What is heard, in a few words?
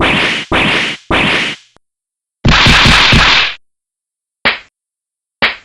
breakdown; regina